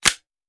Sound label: Hand Gun Foley; Sound Ideas; Guns